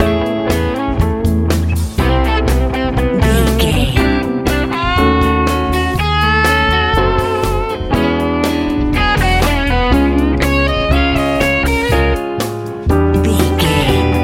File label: strike big good fun love easy pop